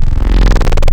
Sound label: Squelchy, Spectral; Synthetic's Serum Collection